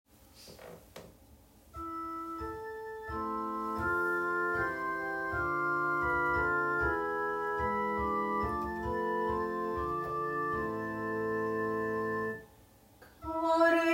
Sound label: alto, cor jesu